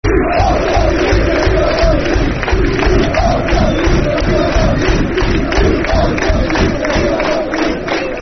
We Are Top Of The League, Football Chant (Soccer Songs); Darlington Dfc